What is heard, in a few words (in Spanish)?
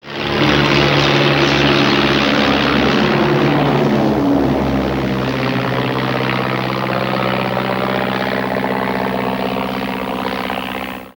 avioneta, trafico